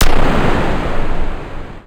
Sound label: explosion vacuum